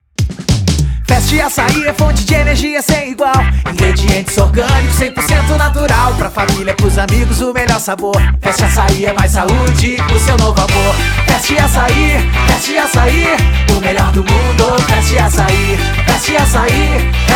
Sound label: jingle; acai; produtoraup